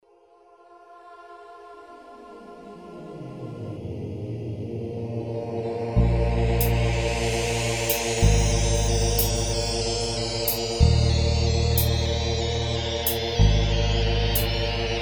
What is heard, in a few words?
Pleasantly Spooky; Soundzabound Volume 1